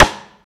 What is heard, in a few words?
processed snare, Deep House; Zero-G Deep House; single hits